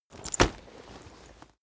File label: leather metal impact hard